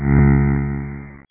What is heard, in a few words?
Beam On; Techno Venture